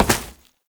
Step Vegetation, Foley; Warframe; Oraxia

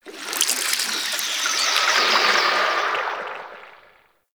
Squelch Goop One Off; Props; Warframe; Tau; Ambience

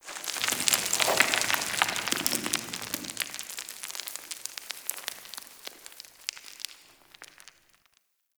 sand one off; Ambience; Warframe; Grineer Settlement

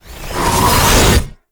Cast Riser; Warframe; Uriel; Remedium

